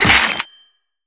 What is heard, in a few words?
slash